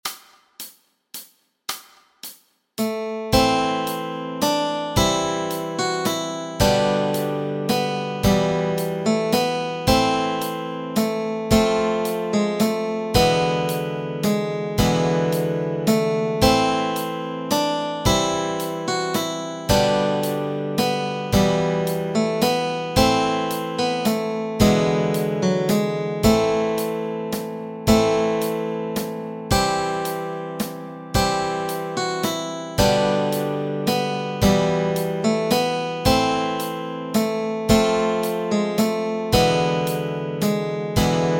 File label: What Child is This melody and chords